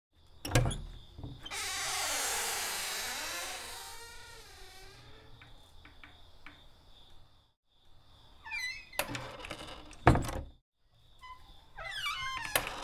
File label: Old Wooden Shed Door